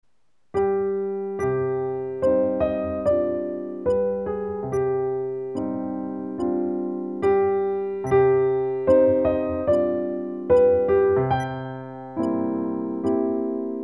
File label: piano